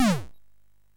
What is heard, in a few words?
Shuffle, sid MOS6581R3